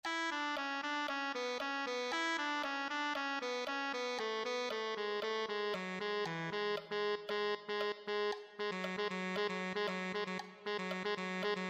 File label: tenor saxophone